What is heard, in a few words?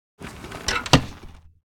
Motorbike kickstand being retracted; vehicle